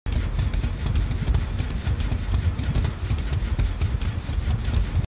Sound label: generator; Dead by Daylight